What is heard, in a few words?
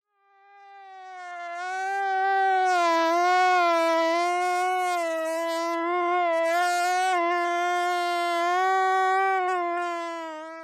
Mosquito-Real